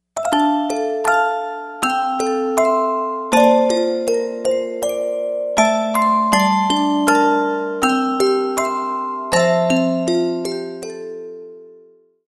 Orgel; Elatha

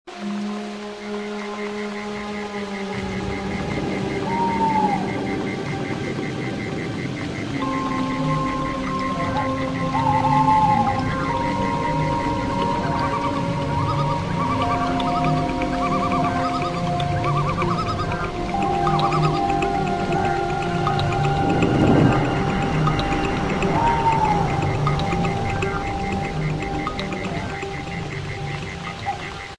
Level forest; Dr. Maybee and the Adventures of Scarygirl; PSP

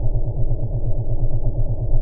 Energy Shield Loop

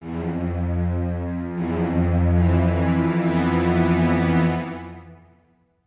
strings; undead overlord linux macos windows gamerip 2014

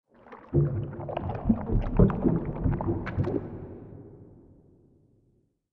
Creature; we are the dwarves gamerip 2016

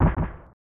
Hit

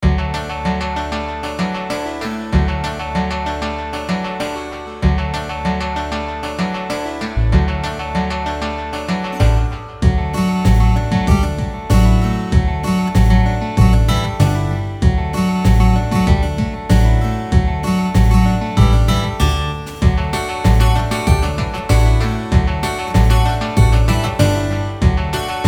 music front end

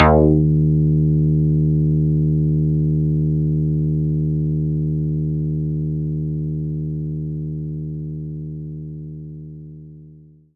Synth Bass F1

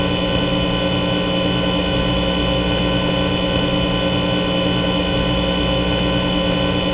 static sound; Five Nights at Freddy's; FNAF 3

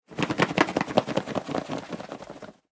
flap

